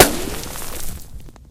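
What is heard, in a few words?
ric, ricochet; Left 4 Dead; Source Engine; weapons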